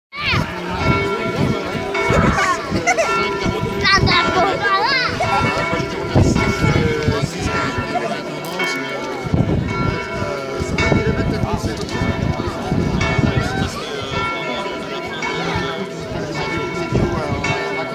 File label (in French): son cloches